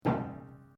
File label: RUBBER ON METAL SMALL; Materials